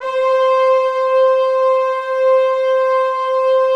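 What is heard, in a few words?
PAD, atmospheric pads; Best Service; XX Large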